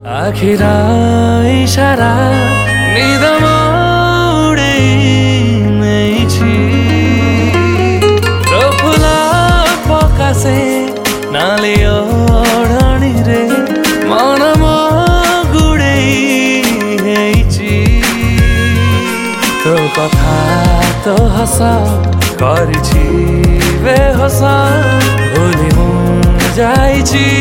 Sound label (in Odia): New Odia Song